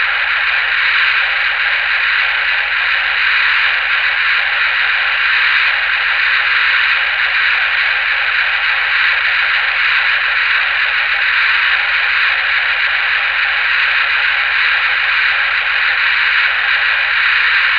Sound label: VHF Radio Aurora